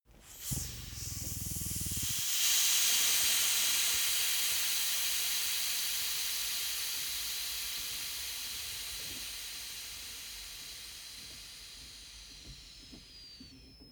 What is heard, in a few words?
soccer air release